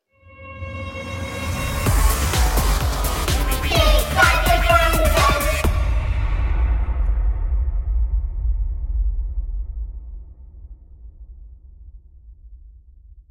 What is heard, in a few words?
jingle; big bag of random